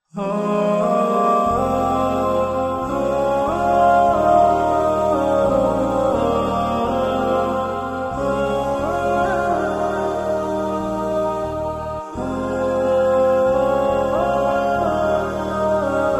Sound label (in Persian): Vocal Shoor